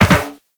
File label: Land Soft Metal Perforated, Footsteps; Characters; Operator